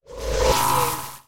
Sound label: robotic interface message